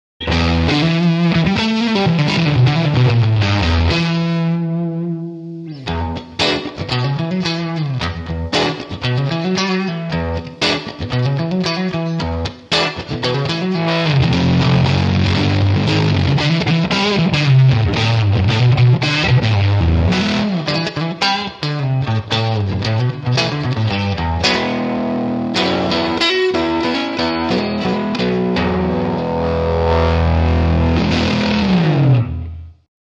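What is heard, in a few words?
Ripped Speaker Guitar volume roll down for glassy cleans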